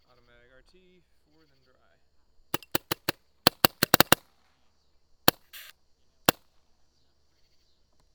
automag raw; guns; automag rt

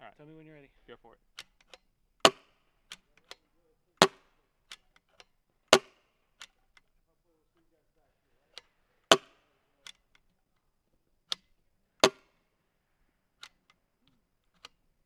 pgp 4 shots 2nd dry 4 dry, guns

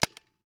carbine clip; 68 carbine

